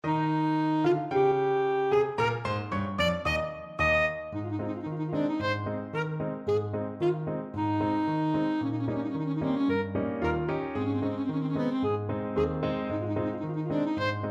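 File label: alto saxophone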